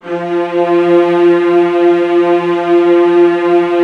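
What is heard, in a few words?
VIOLAS G3; ILIO; Synclavier Strings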